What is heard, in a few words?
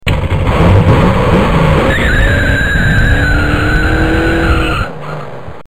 Burnout